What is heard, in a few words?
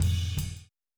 Boogaloo Fill Tom Floor, 82 BPM; Sonic Foundry; Drums from the Big Room The Mixes